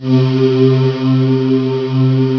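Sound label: CHOIR BELL C3; USB Soundscan vol.28 - Choir Acoustic & Synth; AKAI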